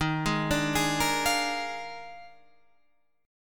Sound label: D sharp Major 7th Suspended 2nd Suspended 4th, Guitar, Standard; arpeggiated; 11,11,12,13,11,13; JGuitar